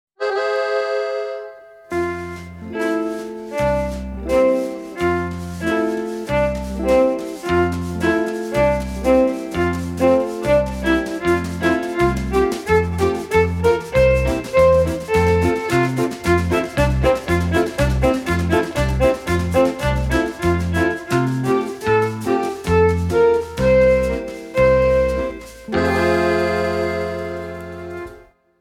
De stoomtrein fragment langzaam en snel fluit

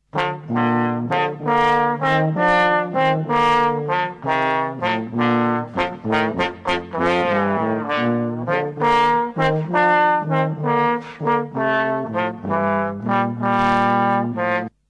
duo tubo en trombone; Welk instrument hoor je

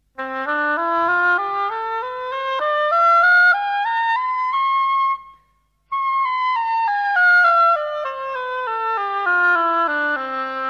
Houtblazers hobo laag hoog laag

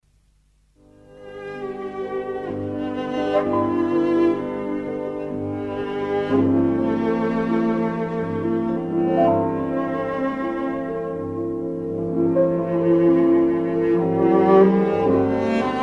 Cello; de zwaan

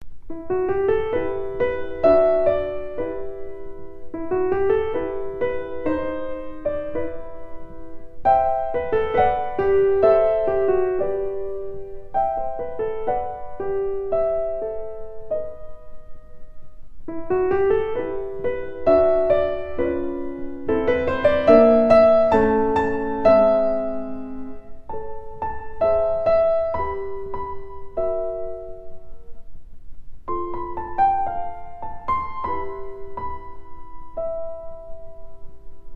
Pianovoorbeeld little song; Kabalevsky

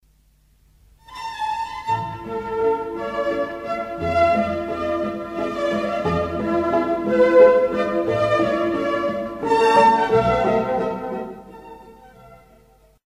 Pizzicato en strijken

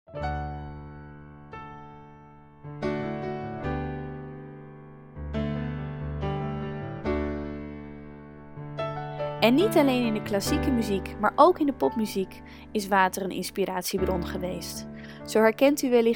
Klokkenspel en medley kinderkoor

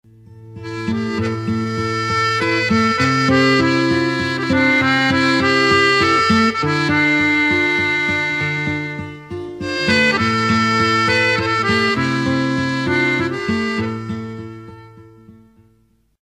Accordeon en gitaar

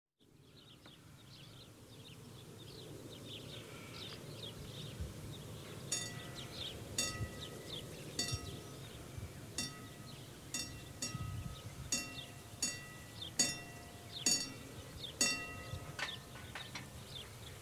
Treingeluid stoomtrein

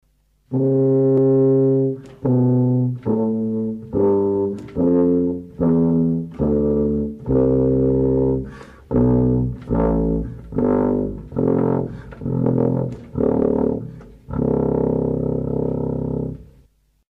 Koperblazers tuba van hoog naar laag

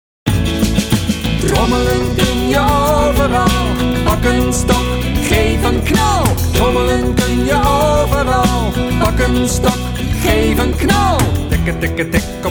trommelen; trommelen kun je overal

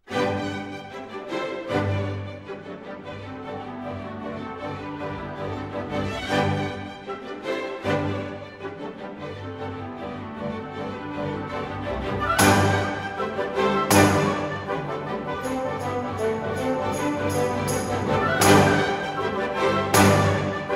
Russische dans

